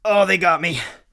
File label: hurt light; Escape from Tarkov; usec2